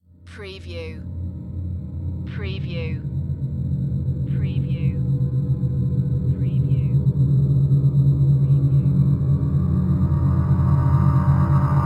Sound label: Drone Crystal Bed Low; Space / Science Fiction (Sci-FI)